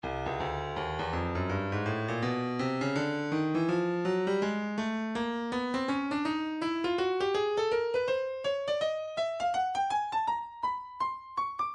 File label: breakbeat 082 bpm; Stylus Breakbeat Midi Files 080 084 Bpm; Baghead B